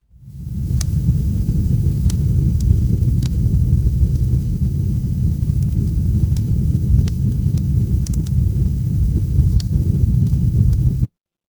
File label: ambience fireplace